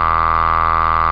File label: Buzzer; toad